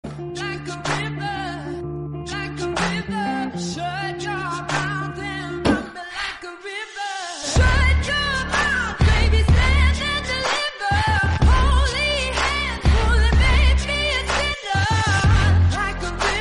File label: English song